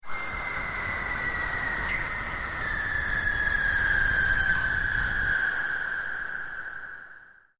Missile Incoming SciFi Warfare Weapon Futuristic Whistle, Incoming Missile Futuristic Whistle Approaching Quickly; Battle (Sound Effects:Science Fiction)